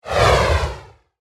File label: Fireball Whoosh, Flange; Production Element, Imaging Element, Accent, Transition; Flame Sound Effects; Blast Wave